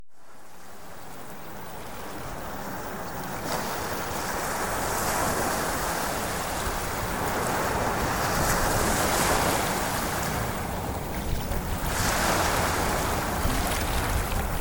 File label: Ocean Waves; Premium Subliminals